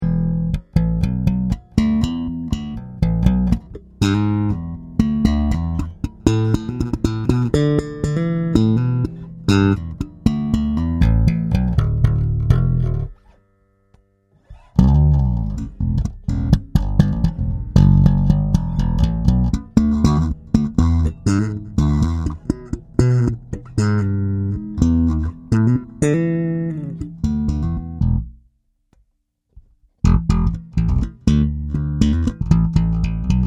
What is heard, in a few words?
pickups test slap, bass; pickup wiring